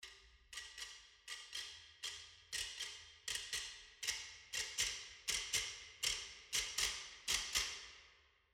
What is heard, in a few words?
Click Ensemble Processed Loose; Cerberus